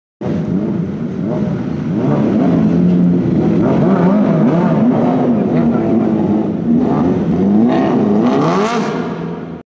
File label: cbr 100; موتور